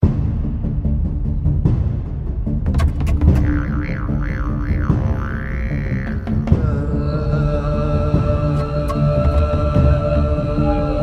Forest Level, Loop one; children of morta

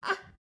baby talk 16 month "ah"

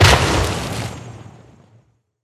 Iceland; Hero Jigou

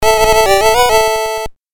Victory!; madou monogataria dokidoki bake shon

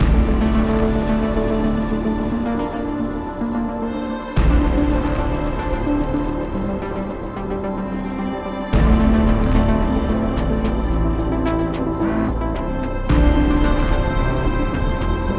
ELEVATOR LOOP; escape academy; The Confrontation

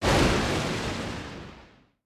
Lightning

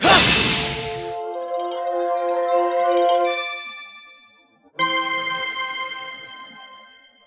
Hit Goddess Cube; the legend of zelda skyward sword wii gamerip 2011

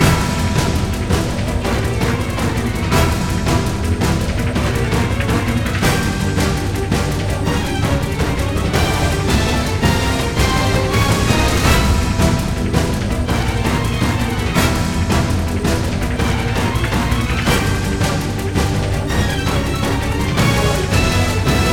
BGM Battle Enemy turn; aster tatariqus android ios mobile gamerip 2023

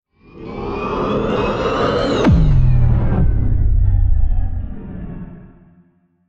Low Warped

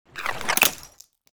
Ice Spike; lego ninjago rise of the snakes

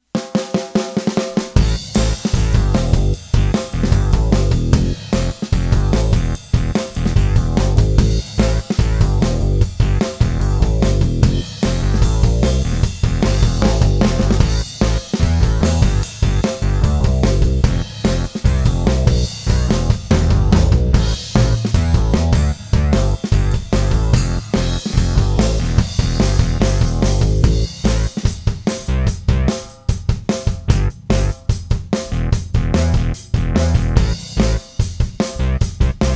live drums syn bass bouncy cool jam; Gnomelings Migration

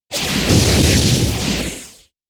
Robotic Sound Mix

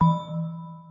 Game Admit Finish

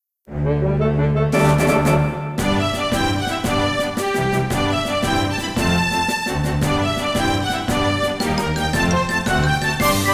Ending; Kirby Air Ride

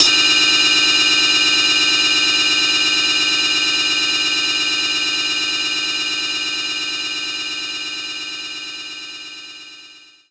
ride bell

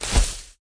Footsteps

